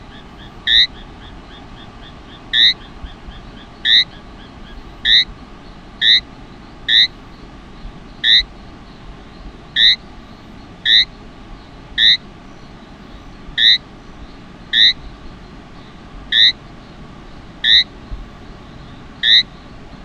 Adenomera juikitam

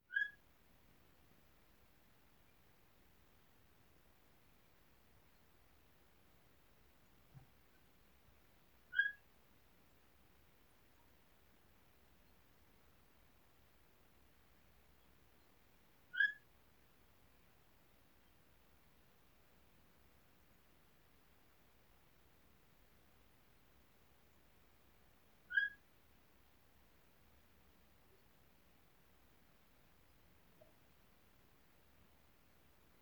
Theloderma asperum

